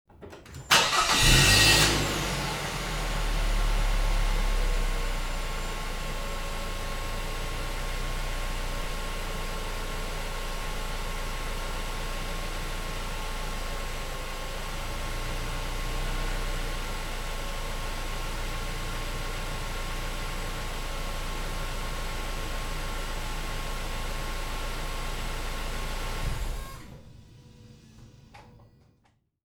car ignition; trasporti; auto

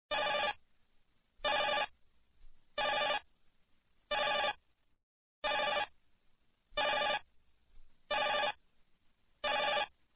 Digital Phone Ring

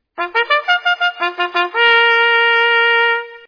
Bugle Call Carry On; armi

